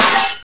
metal, debris; valve

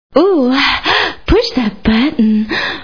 button; valve; dutch

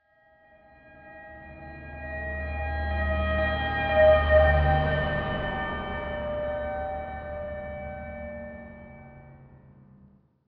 car ambulance; valve; blackhawk; ambience

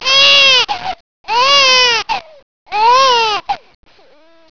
baby cry; valve

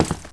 step

